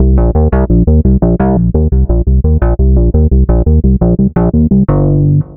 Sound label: Synth Bass